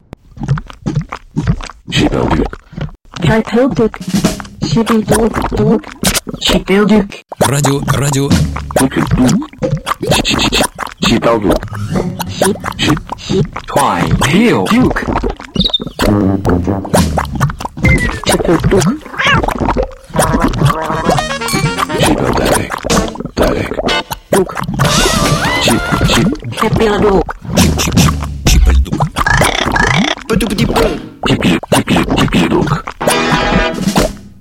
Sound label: jingle nonsens